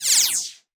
sfx, futuristic weapons sound effects; Scificannon

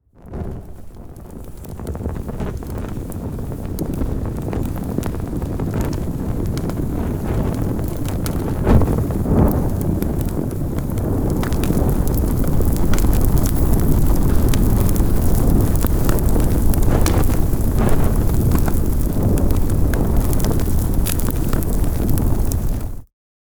FIRE DEVELOPING, Fire sound effects

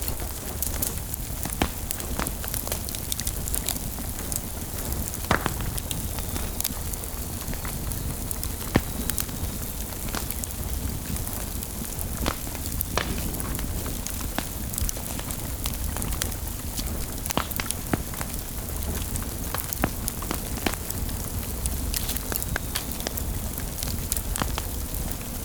LARGE EMBER FIRE, Fire sound effects

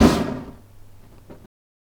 Fire Staff, Fire sound effects

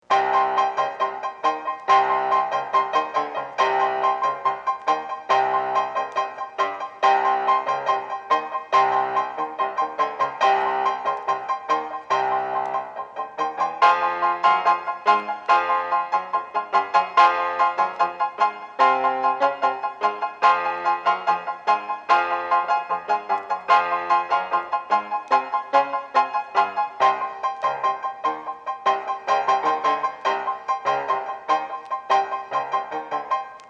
Villainous treachery distressed, dramatic

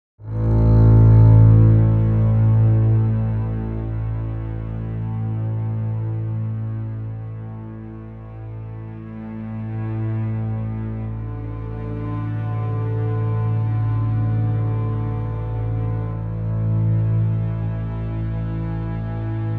Mourning song

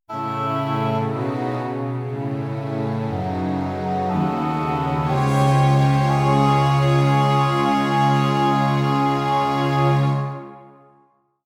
Big Horns Intro

